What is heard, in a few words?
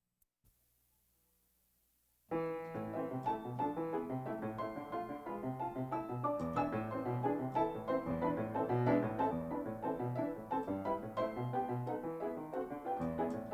etude, historical instruments; Chopin etudes